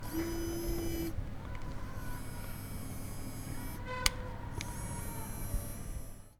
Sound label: Camera